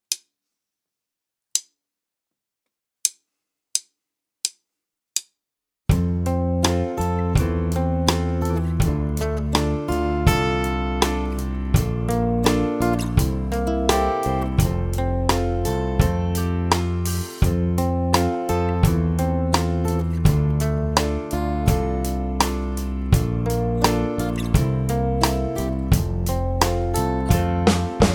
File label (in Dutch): Improviseren met a en c